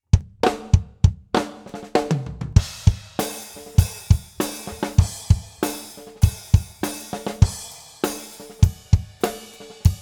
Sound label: drum mix